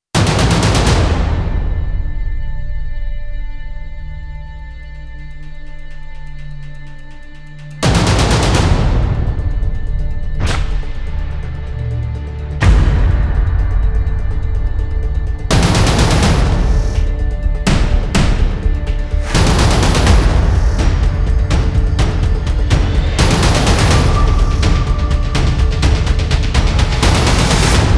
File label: no rise no braam guitar